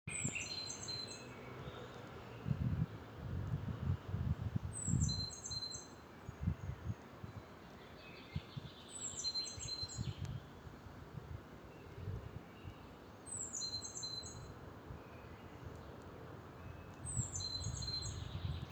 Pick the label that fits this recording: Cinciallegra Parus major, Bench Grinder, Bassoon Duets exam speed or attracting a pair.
Cinciallegra Parus major